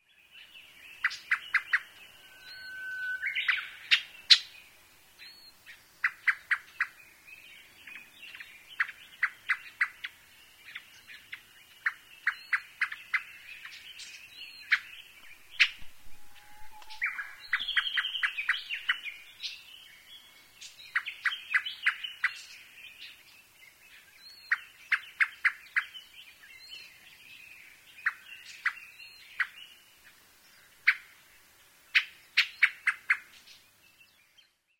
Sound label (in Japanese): akahara; アカハラ